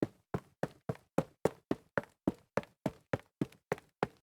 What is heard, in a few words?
jog up stairs concrete